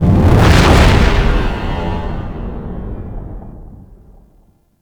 Sonar; Decree

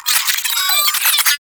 space drone pain; Enemies; Warframe; Corpus Space Drone

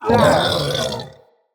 Corrupted Pain, Animals; Bromius

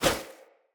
Bow Melee Arrow Whoosh; Weapons; Player; Bows; Grand Father Bow

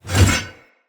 One Handed Axe Elite Whoosh Heavy; Weapons; Enemy; Axes; Sinecure One Handed Axe Elite

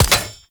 Fishing Spear Damage Hit; Weapons; Venus Fishing Spears; Warframe

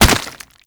Golem Gun Projectile Hit; Warframe

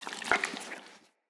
Battle Dog Lick; Animals; Vocals; Snc Battle Dog

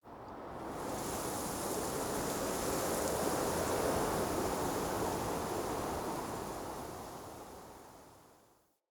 Wetland Forest Day Wind Gust Relaxed; Midrath Island; Ambience

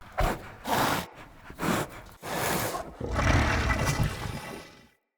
Corrupted Battle Dog Idle Four Sniff

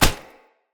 Unarmed Punch Impact Flesh Armour Hollow; Weapon Impacts; Player; Combat

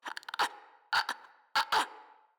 Infested Crawler Breath; Crawlers

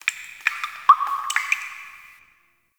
Drip, Ambience; Warframe; The New War; Zariman

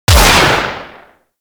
Heavy Shotgun Alt Shot A; Warframe; Tenno

